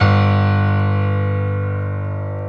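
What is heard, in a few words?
piano single bass long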